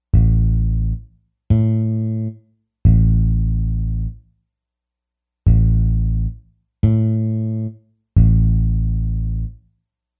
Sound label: bass